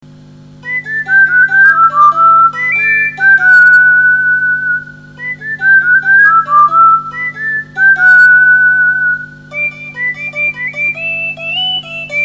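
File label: whistling western; kuchibue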